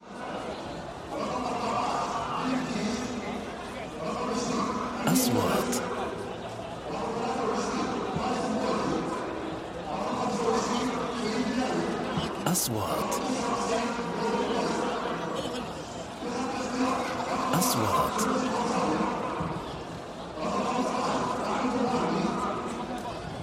Enter the players and the audience of a football field